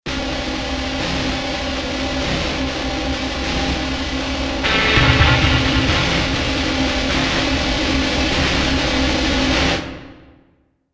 Crunchy Drone; Best Of N2O Library; Drones & Foundations

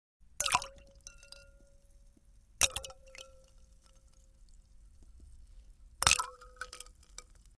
Ice Dropping Into Glass Of Water; Household

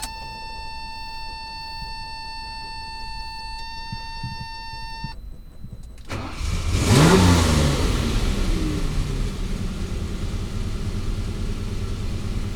engine start

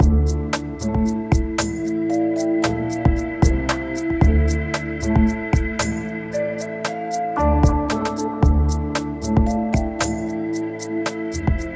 Talk Over Me, music beds

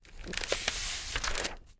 turn page, reading book; 1000fx

